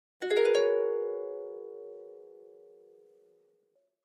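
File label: Harp Thin Short High Strings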